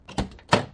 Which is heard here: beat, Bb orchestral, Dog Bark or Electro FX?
Electro FX